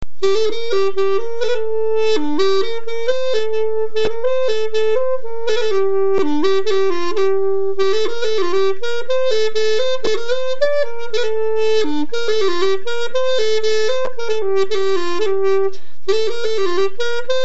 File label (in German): chalumeau